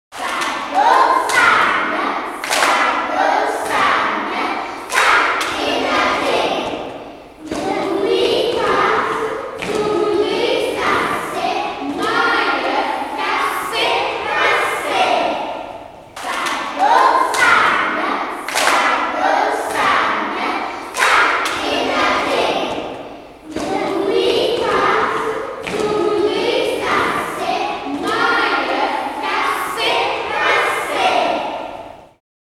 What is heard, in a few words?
the baby goat song